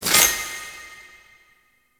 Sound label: Citrine Crystal Pickup; Warframe; Pickups